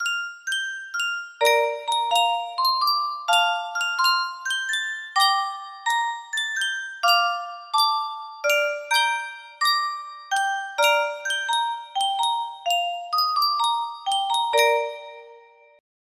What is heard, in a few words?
yunsheng music box